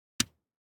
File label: DICE on Felt, Drop, Standard, Two Dice; Samples; ArticulatedSounds; DICE Sfx Party (Extended)